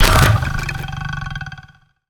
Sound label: stinger beast; Soundiron; Sick 6